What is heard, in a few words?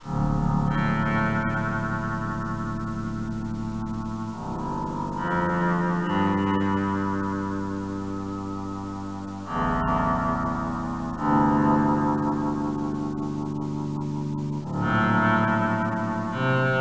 menu; Zombie Smasher